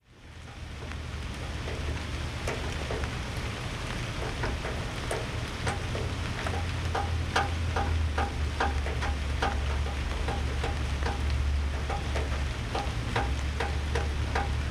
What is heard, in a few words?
Rhythmical Rain